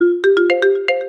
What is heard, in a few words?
Notification Sms